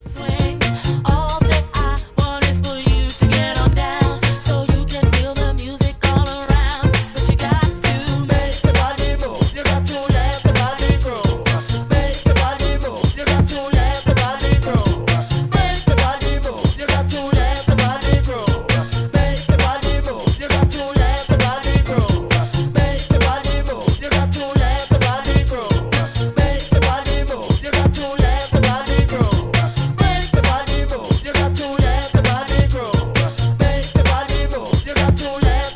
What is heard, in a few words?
Body Groove